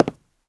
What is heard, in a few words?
wood step; Golden Crayon Sounds